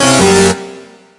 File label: Music Frontend